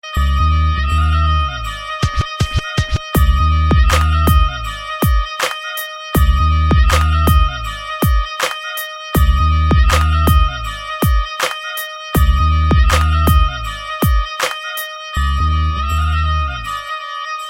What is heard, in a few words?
Dj Mix Dhol